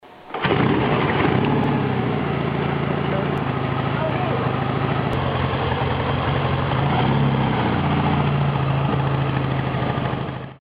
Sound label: norton atlas 750, motos